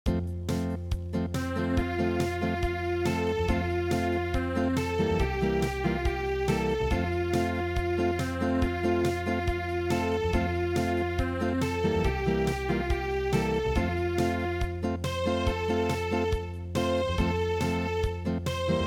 Acoustic; Happy Camp

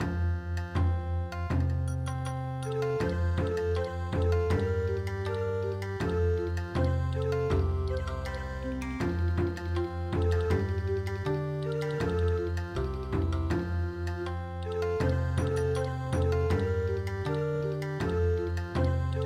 Bon Odori, traditional; midi

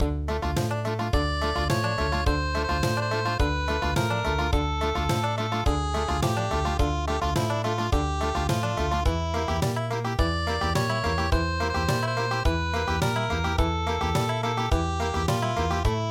Country, midi

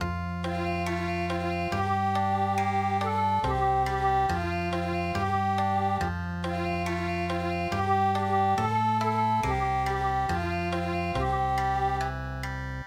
Okinawa; Nusudo Doi

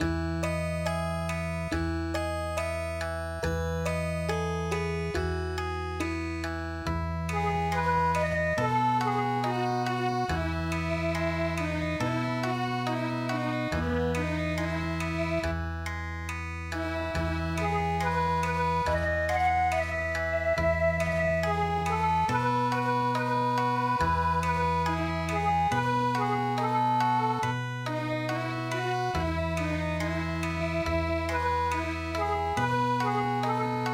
Okinawa; Ashi Miji Bushi

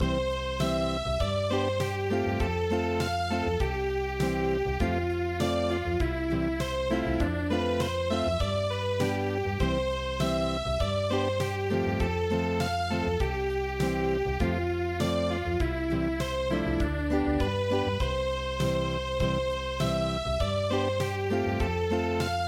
GAVOTTE Acoustic

